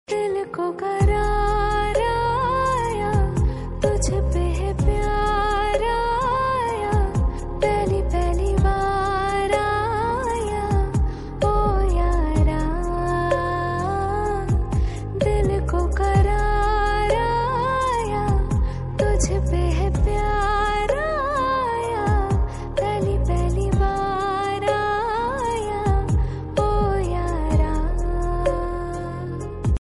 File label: Female Ringtone